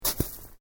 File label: Footsteps Boots Snow Shallow Step